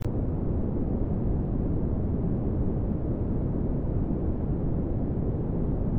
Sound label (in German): blaues rauschen